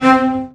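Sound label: CELLOS D4, Strings; ILIO; Synclavier Strings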